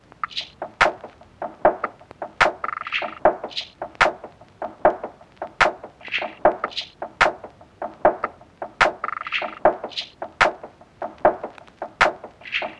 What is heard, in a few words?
Lofi Percussion Loop - 75 BPM; Cymatics; Lofi Starter Pack